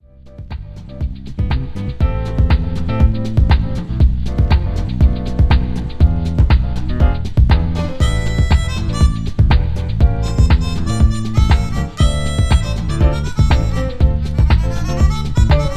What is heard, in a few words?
Salsa; Me & My Furry Patients 3D; 3DS gamerip 2014